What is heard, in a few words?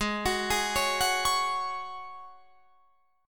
G sharp 7th Suspended 4th G sharp, Guitar Drop D